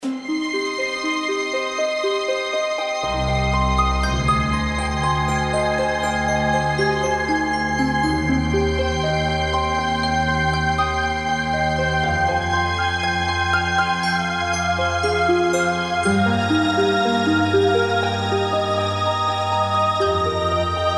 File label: Mystical Prelude, acoustic, atmospheric